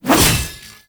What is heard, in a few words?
Whoosh; Warframe; Weapons; Tenno; Revenant; Nikana; Tatsu Prime